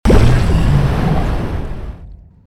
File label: Blood Laser Large